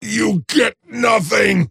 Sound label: deny pain; Axe